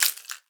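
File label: rattlebone, percussion; ILIO; Synclavier Percussion 2; World & Orchestral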